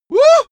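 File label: female scared comic, screams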